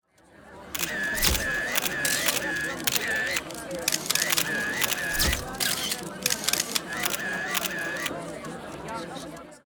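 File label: Camera Shot Loop; Yu-Gi-Oh! Master Duel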